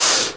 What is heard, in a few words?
Hat; 德云社; 武坠子